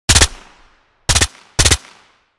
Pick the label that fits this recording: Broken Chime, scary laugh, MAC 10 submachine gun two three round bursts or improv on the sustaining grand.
MAC 10 submachine gun two three round bursts